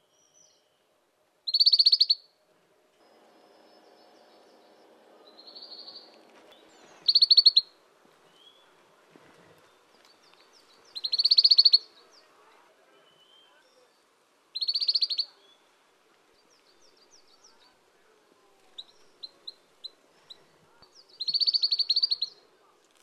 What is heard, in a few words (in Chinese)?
栗背林鴝; 阿里山鴝; 鶲科/留鳥